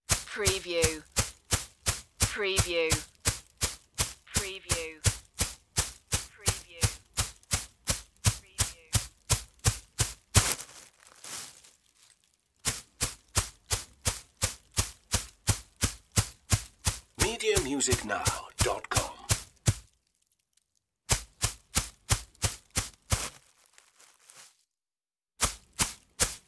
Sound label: running in the park